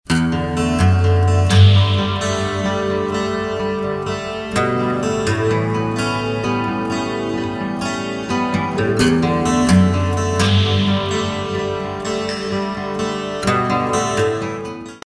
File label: musicbed sample, newage